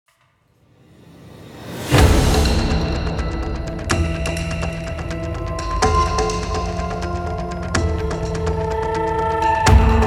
Tension Drum; Bossam Steal the Fate; Korea

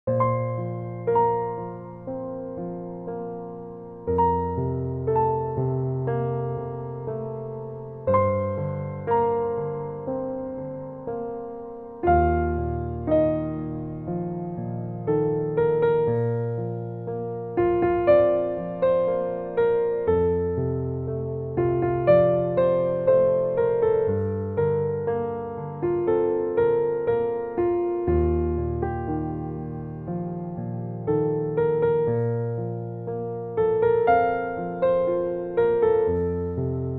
PIANO; China

